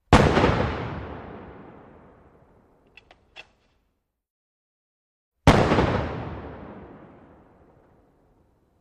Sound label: .50 Caliber: Fire And Shell Eject, Fire Again.